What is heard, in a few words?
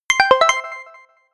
notification call